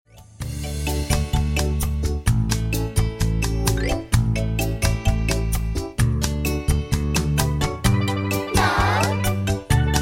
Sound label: (Karaoke)